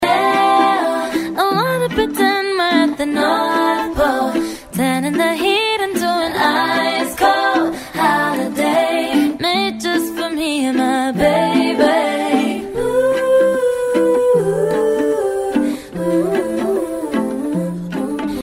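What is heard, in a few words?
New Year ringtone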